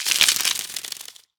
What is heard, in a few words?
mantis bigwing